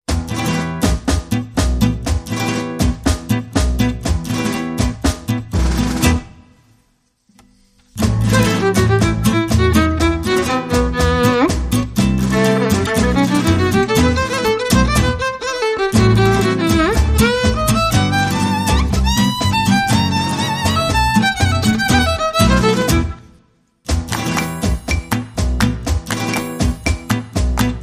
No Accordion

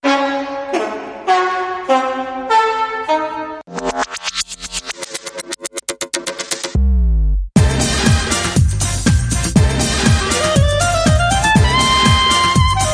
Disco

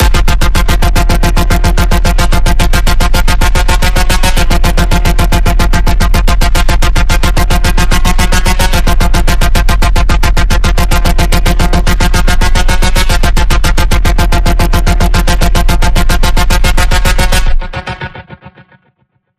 Pulse, F

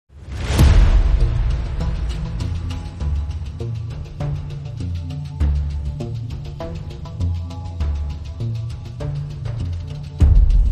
No Strings

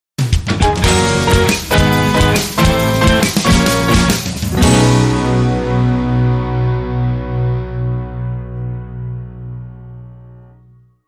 Shuffle Up Fast

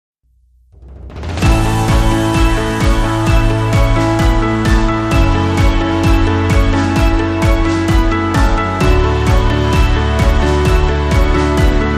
Strings Versus Keys Short

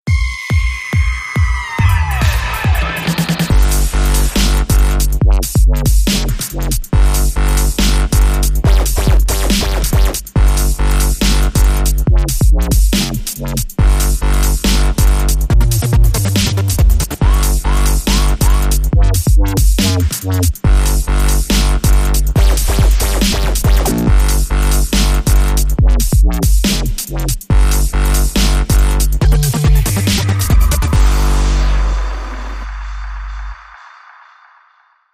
Low Frequency Oscillator

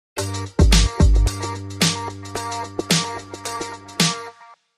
Sting

